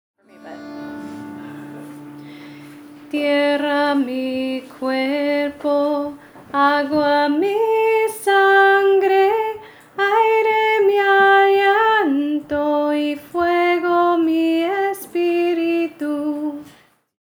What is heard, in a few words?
Tierra Melody Solo